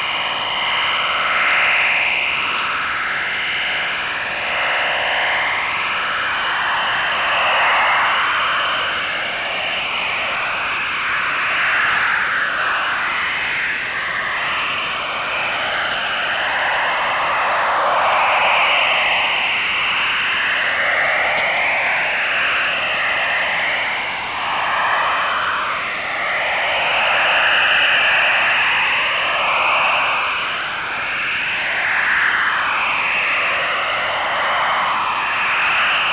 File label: bpsk-2400; t-128bit